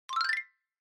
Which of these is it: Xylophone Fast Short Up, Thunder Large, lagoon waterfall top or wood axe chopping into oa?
Xylophone Fast Short Up